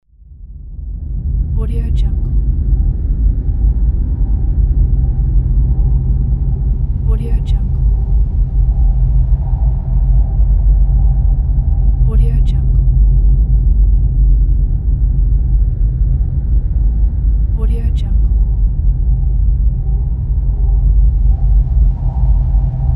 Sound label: low sub ominous drone